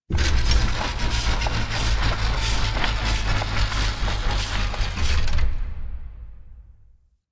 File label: Misc Machines, Asteroid; Ambience; Warframe; Grineer Asteroid